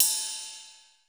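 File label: RIDE EDGE, drum; Crypton - DrumBase5000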